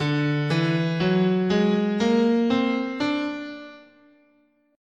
D Whole Tone, scale

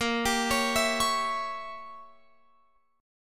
A sharp Diminished 7th, Guitar Open F; A#dim7; arpeggiated; x,17,19,20,19,20

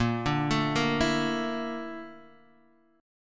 A sharp Major 7th Flat 5th A sharp Guitar Open D

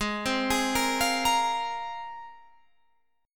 G sharp 6th Add 9th G sharp Guitar Standard; arpeggiated; JGuitar

